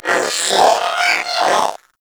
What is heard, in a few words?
Zanuka Companion Vocal Pant; Pets; Warframe